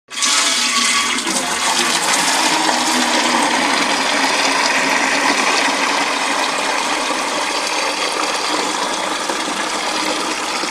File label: Tin Can Filling Wate, splashes